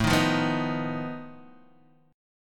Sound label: F 7th Flat 5th A